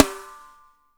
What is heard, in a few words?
SNR; Spectrasonics; Burning Grooves